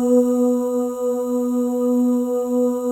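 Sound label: OH, female OHS, Voices; Spectrasonics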